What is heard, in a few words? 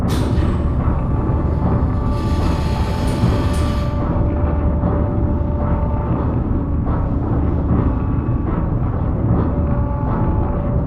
Assembly Giant Rotating Pillar; Boomtown; Titanfall 2